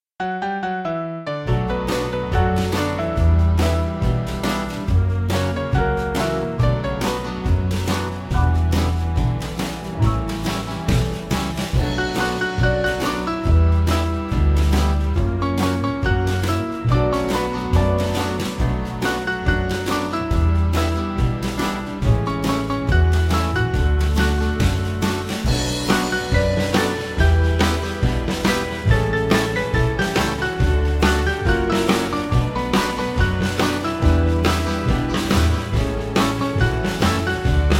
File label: Great And Marvelous, Band; Small Band; 2/Bm-Cm